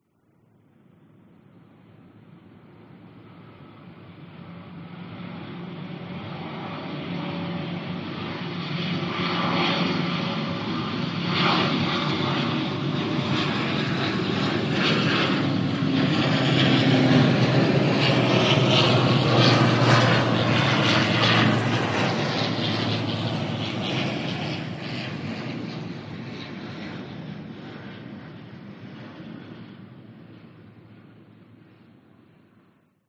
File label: vehicles airplanes military fokker 50 propeller aircraft fly past slight dist